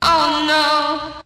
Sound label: "oh no" SUNG; Voice